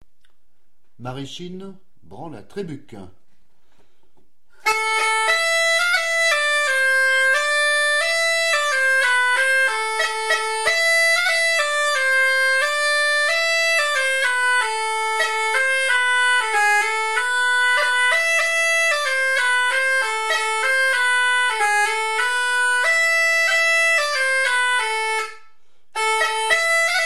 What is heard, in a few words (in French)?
Maraichine Branle; Maraichine 2; Trébuc